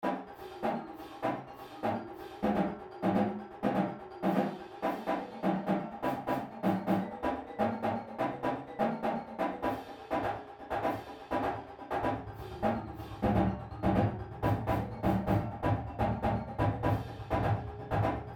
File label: Drumline Exercise; Drumline Ensemble Series; Fives